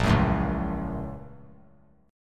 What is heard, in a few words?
Bb Minor Major 7th Sharp 5th; BbmM7#5; strummed; JGuitar